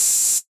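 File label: Analog Hi-Hat Open Distortion; Yamaha DJX-2B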